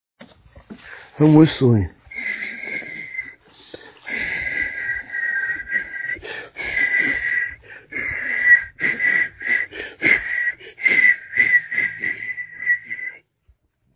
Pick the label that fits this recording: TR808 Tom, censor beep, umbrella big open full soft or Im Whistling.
Im Whistling